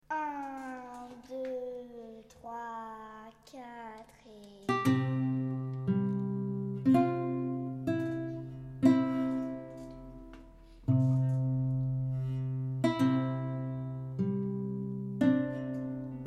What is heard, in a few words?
guitars girls